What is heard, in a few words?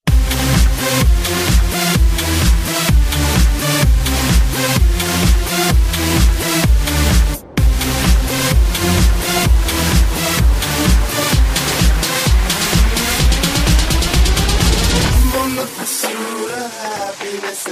Rave to Dubstep Transition 128 70